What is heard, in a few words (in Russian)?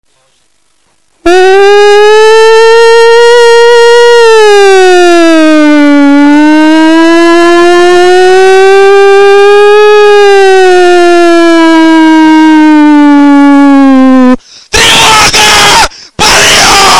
bydilnik; Дикий будильник